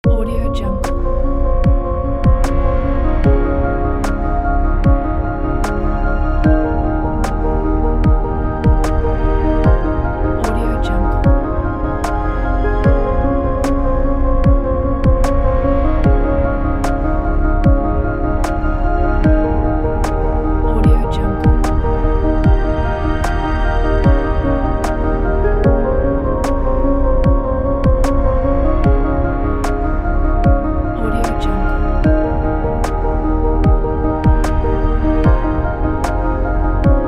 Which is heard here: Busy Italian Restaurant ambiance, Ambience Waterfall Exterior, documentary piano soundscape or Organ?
documentary piano soundscape